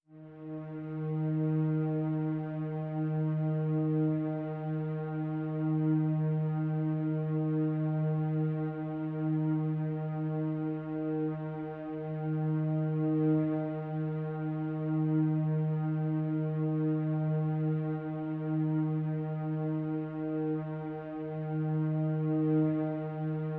sinewaves; airtone

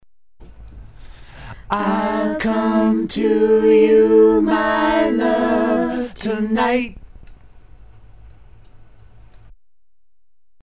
vox

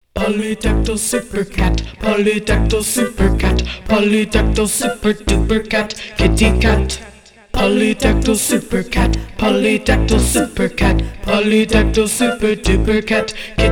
Vocals Bass Synth; Polydactyl Super Cat

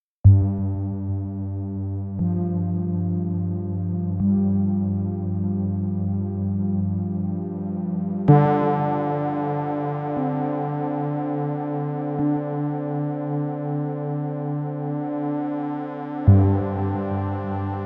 G120 GX80 pad

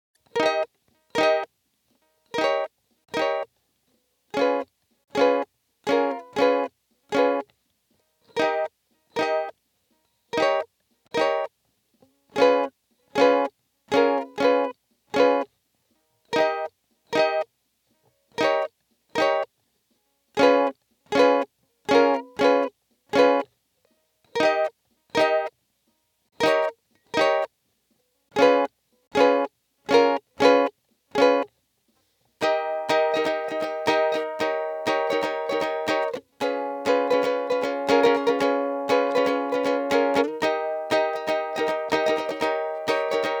Uke Groovy; Uke Be Groovy

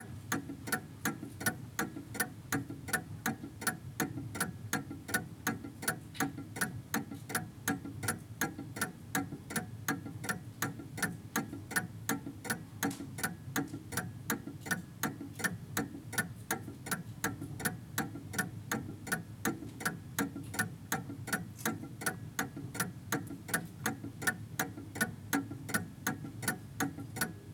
Tick Tock Antique Clock

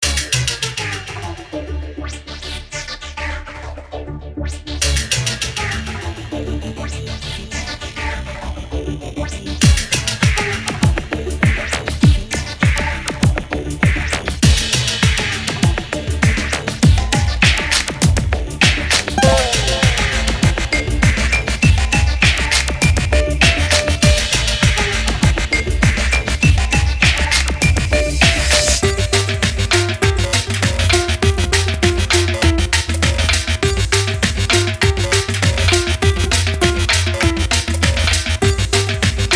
Ukuambient Noise

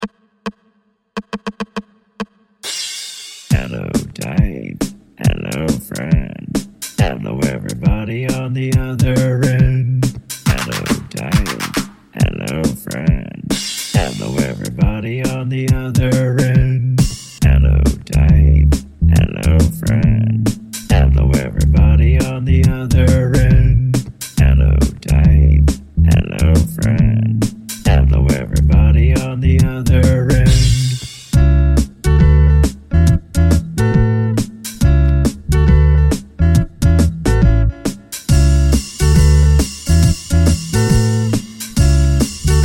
in G

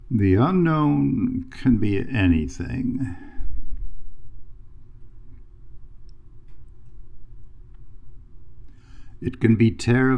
raw vocal

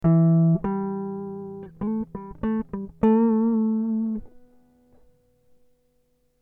Em 100 GTR Riffs

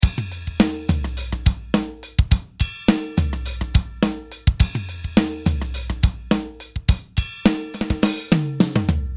beat

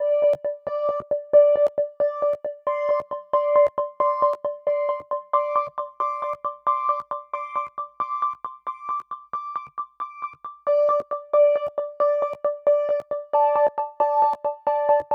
Dm90 PULSE

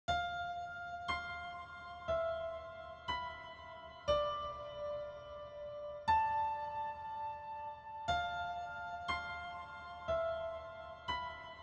Spacey dream 60bpm Echo Piano

